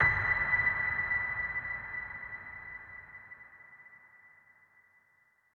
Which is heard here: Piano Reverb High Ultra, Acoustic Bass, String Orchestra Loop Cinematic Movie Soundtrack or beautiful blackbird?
Piano Reverb High Ultra